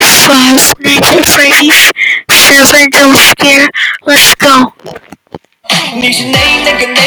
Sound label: jump fart; fnaf 7